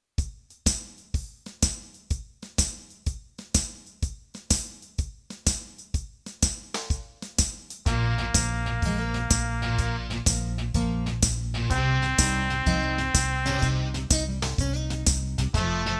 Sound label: Funky Beat, brass practice